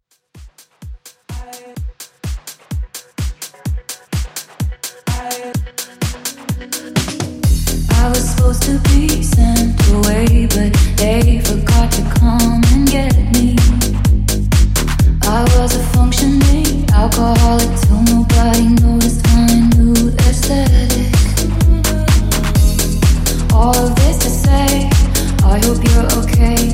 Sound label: Future House Rework; Remixfreakz